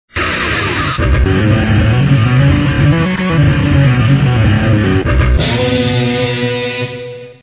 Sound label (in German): klavier; reversevape